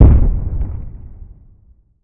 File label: fs coin land full; netent